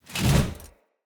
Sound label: Mace Slap Whoosh, Two Handed Mace; Weapons; Enemy; Blunt; Sinecure Two Handed Mace